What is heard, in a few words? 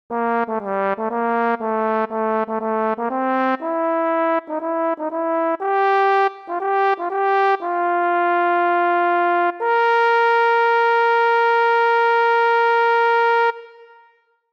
Bari, barbershop tags